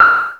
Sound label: Bell, Roland System-100; Drum Samples